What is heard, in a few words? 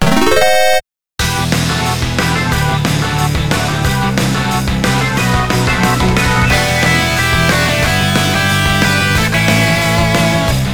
Red Alert; Neo Kobe City